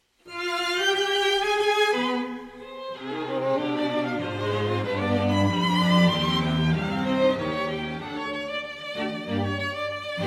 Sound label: String Quartet Allegro; No. 1 in C Minor Op. 51